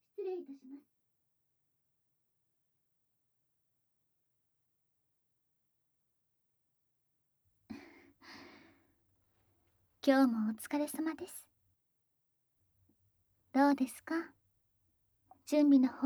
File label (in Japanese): 媚薬発情エッチで好き好き連呼でオホオホいちゃいちゃ♪; 異世界シスターの隠れた品性～村一番の清楚シスターさんの本性は、オホ声下品アクメ好きなよわよわ最弱おまんこの持ち主でした～